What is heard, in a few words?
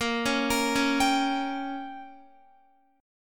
Bb Minor 6th, Guitar; Bbm6 arpeggiated